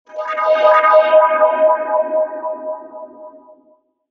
harmonic event

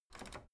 washing machine tumble drier knob

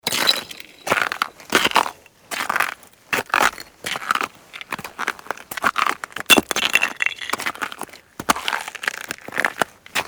steps on ice

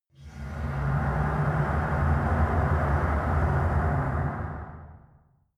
mechanic ambience dark

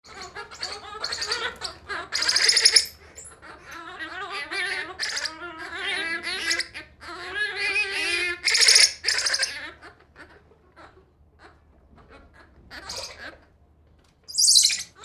skull monkey

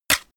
cd case scratch short

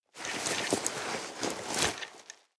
nylon leather medium clack

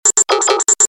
console control

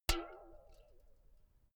filled can hit ringing long

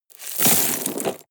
drop rock debris hard short

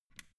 switch small turn off soft

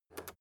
rotary control short turn soft

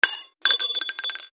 bullet shell drop

